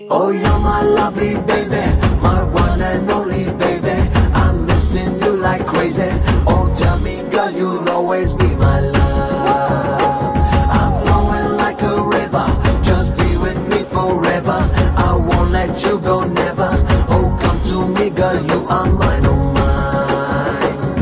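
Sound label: background music Telugu Ringtone; Kandireega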